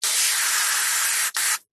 household water jet spray from hose on flip flop cleaning med burst